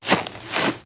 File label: Grass Turn; moul-assets